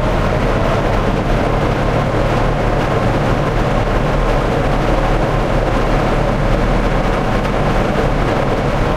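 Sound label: tigerdiesel engine interior rpm 33, tanks; War Thunder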